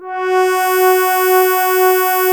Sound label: SYN Space Wave; Best Service Gigapack 1